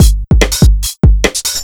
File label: 145 drum loops; KrustingOver; M8; Electronisounds